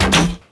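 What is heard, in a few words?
archer bows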